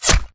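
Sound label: impact bullet generic headshot human; Titanfall 2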